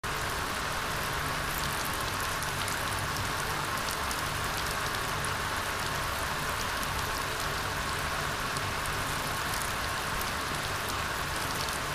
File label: Rain, nature sounds